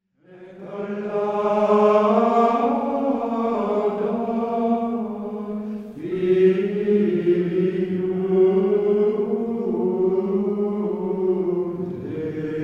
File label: chant; gaudeamus